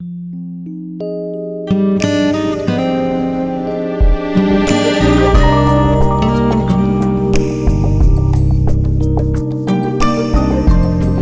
Guitar theme

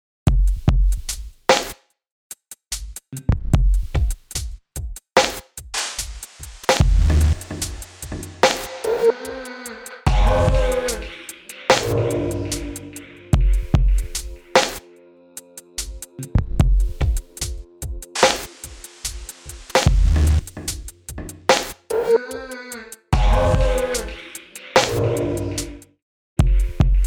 INST

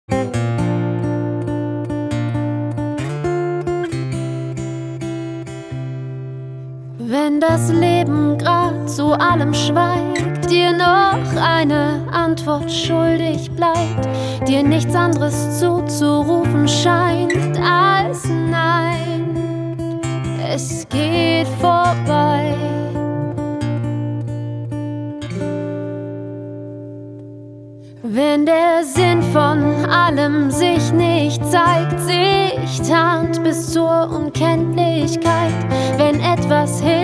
Song Hey

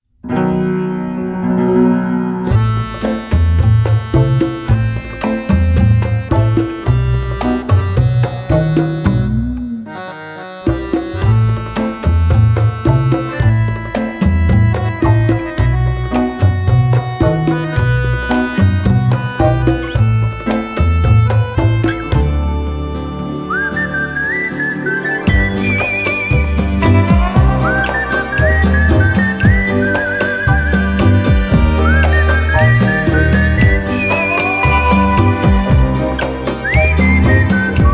Bolero

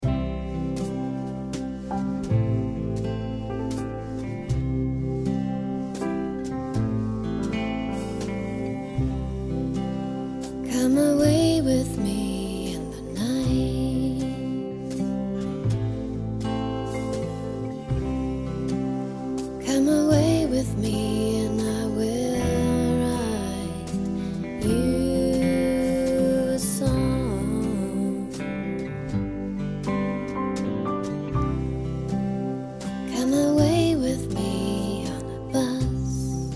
Vocals (Gesang); English NEUTRAL

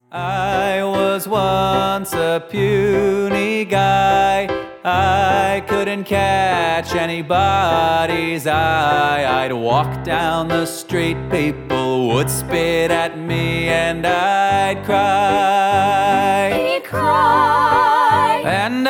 Singing Broadway Shouting

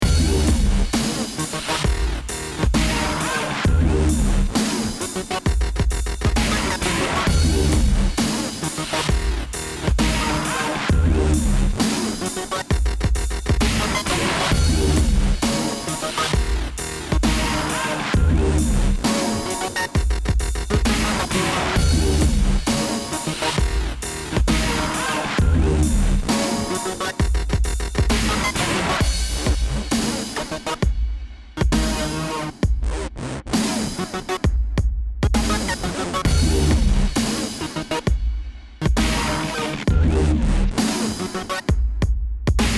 dubstepper

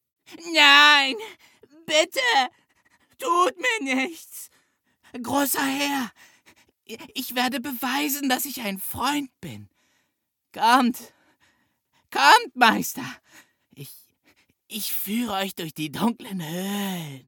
Creature Small; der Hörverlag / hr2 Kultur - Der geheimnisvolle Briefschreiber - Frühe Erzählungen, Anfang

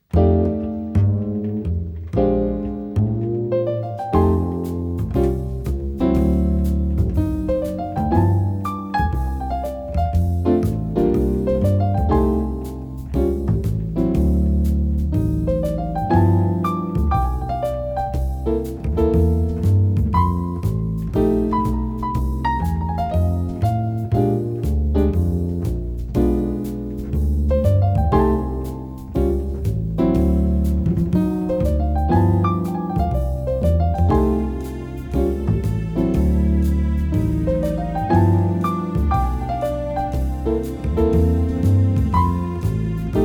Ballroom Bass

